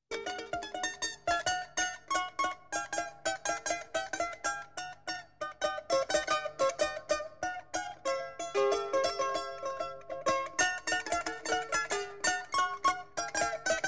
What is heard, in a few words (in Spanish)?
las barriadas, flauta guitarra y arpa de los andes